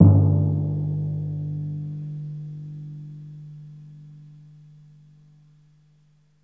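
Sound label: timpani pianissimo G1; Best Service; Peter Siedlaczek's Advanced Orchestra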